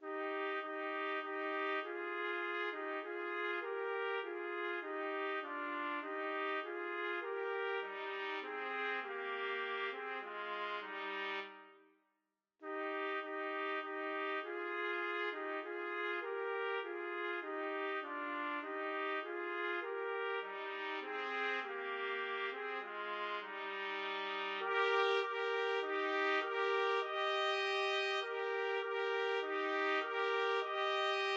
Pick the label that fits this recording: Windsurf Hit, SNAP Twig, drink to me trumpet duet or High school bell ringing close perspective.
drink to me trumpet duet